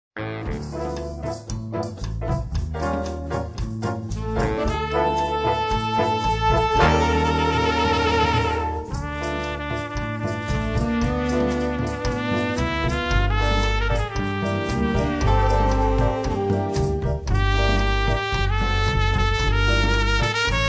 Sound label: Latin Fantasy, big band